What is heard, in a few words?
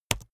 keyboard laptop enter press fast hard